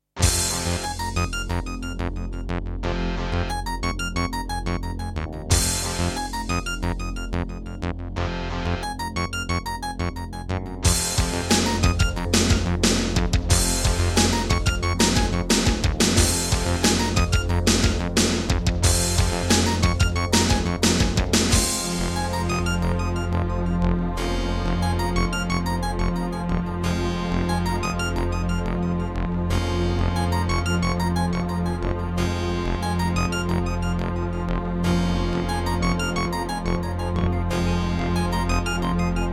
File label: Terratec WaveSystem; Doom (Sigil)